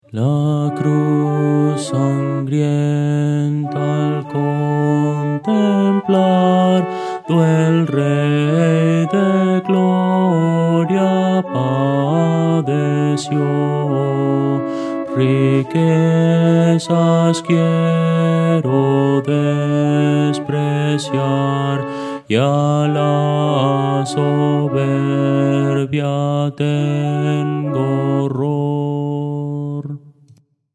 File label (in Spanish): contralto; Voces para coro